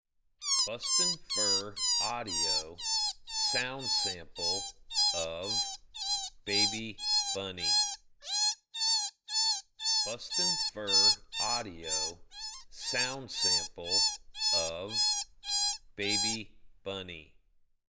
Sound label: Baby Bunny